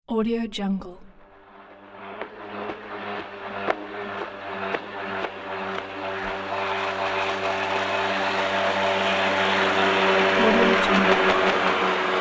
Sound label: jet ski pass by; leksab